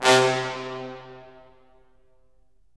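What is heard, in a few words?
brass cimb, Roland; Roland LA Composer vol. 4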